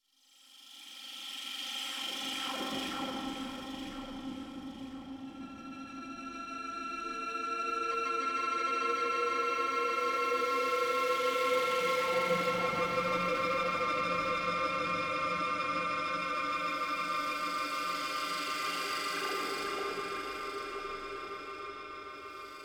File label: Boss Fight Outro, Music; Warframe; Octavia Quest; Bard